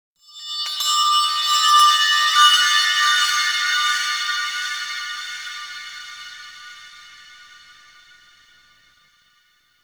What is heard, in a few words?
Metal Chimes; Ambience; Warframe; Nokko Colony